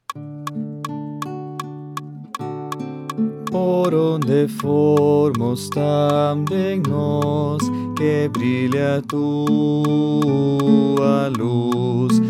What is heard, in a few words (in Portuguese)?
Baixo